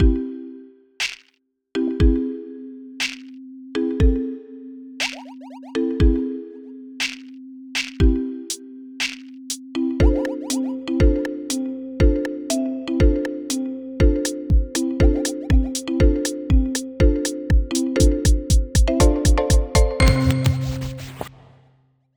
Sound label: Flora Jingle